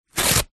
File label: card cereal box rip; foley